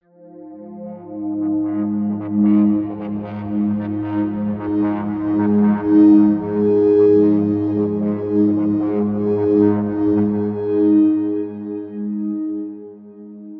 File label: atmospheric feedback pad low, drones; musikelemente